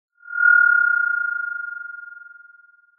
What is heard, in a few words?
Sound of an owl